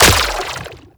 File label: Grenade Bounce; Warframe; Lasrian Soldiers; Weapons; Lasrian Chem Nox